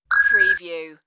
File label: Zinger